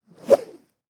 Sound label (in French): fleche decochee